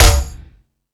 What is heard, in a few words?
Dance, Drum Machines